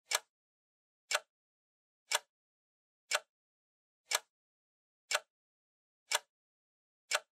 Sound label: Clock Second Hand Dry Loop